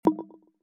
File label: roulette pause batting